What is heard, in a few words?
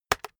keyboard external enter hit fast